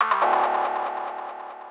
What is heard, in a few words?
KEY SHOT TEKNO 140 A; Techno2; Singleshots